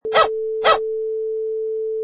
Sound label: Dog Barking